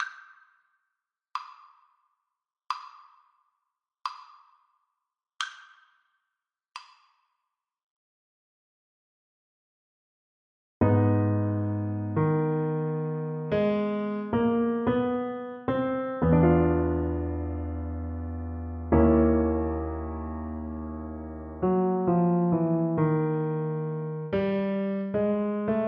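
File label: happy jazzy birthday alto sax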